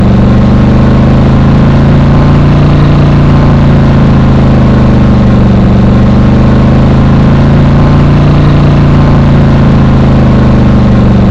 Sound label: low, engines; BRABUS 900